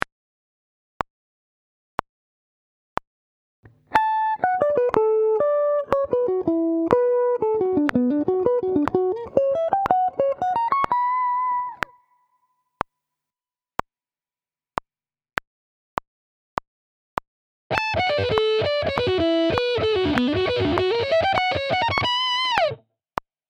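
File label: Sweep Picking, guitar; Sweep Picking Part 3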